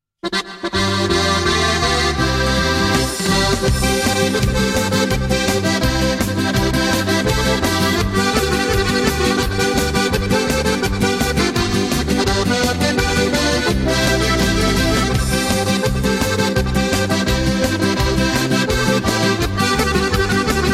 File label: German Polka